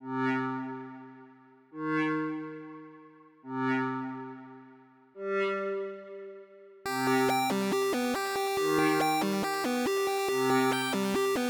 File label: electric groove